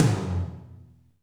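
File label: tom drum, right; Best Service; Real Mega Drums VOL-1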